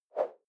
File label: whoosh simple short fast